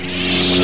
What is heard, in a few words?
saber up